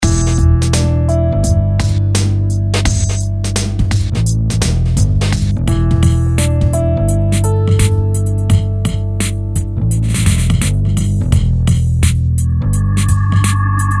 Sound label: BGM